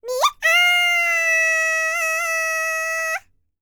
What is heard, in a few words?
BLACK SEA, World Voices; ILIO; Vocal Planet VOL-5